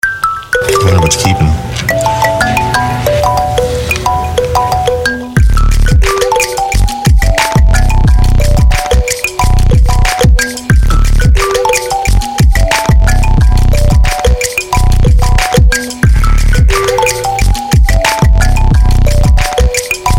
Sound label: mbira; ringtone